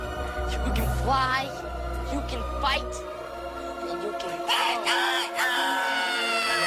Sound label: Turkish Saz